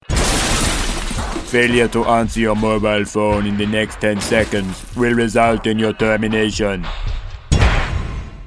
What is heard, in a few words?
message alert tone